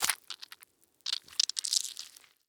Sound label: Horror Scary Guts Wet Human Guts Sounds